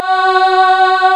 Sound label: PPG CHOIR F#4; East West - Global Sounds; PPGWAVE22